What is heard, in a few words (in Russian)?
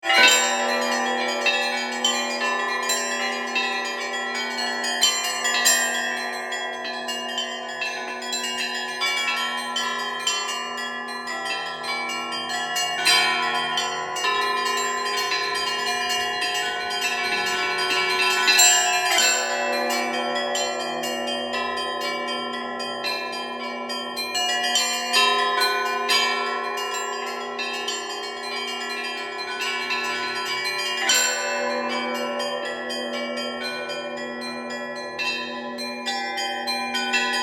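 Dozhd Kaluga, rain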